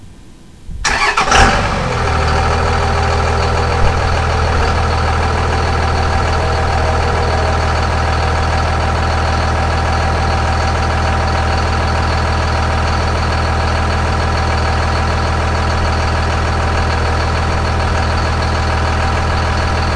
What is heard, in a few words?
start, diesel